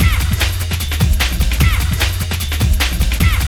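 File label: drum loop; X-Static Goldmine 3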